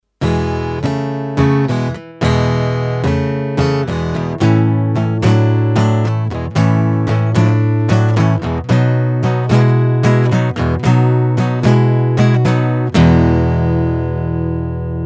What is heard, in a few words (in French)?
Accord ouvert Mi